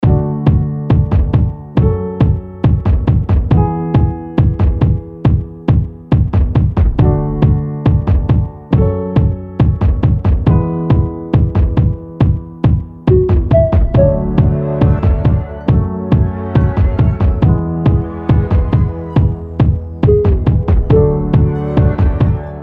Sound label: Instrumental